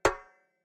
Step Metal; 30 Days to Survive

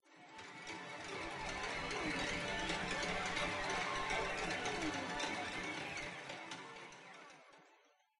sounds music; Halo Combat Evolved; Xbox

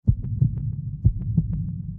Low HP

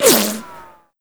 bullet flyby slow; gamerip; shattered horizon 2009

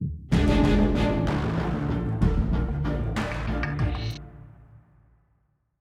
190bpm; Legends of Pegasus; windows gamerip 2012

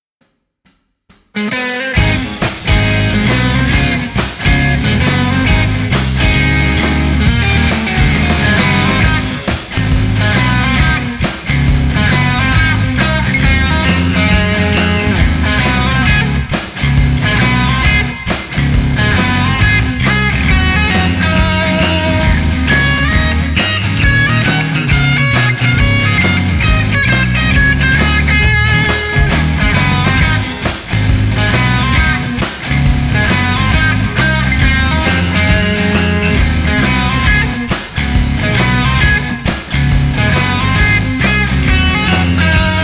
BLUES; frozen frog 2007; CIRNO

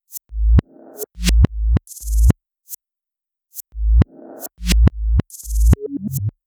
Beat Up

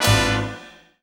Combo Oldman Hit; Restaurant

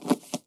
Item Pickup; Welcome to the Game II

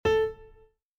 Piano; Scarlet Hood and the Wicked Wood

